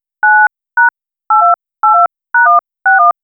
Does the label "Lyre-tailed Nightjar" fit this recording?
No